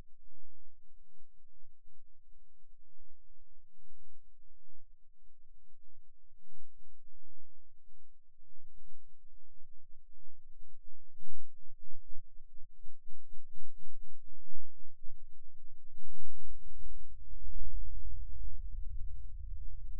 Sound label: Rhythm Seizure, eeg